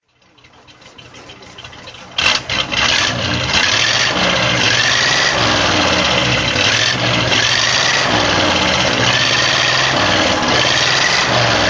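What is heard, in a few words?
cadillac61 throttle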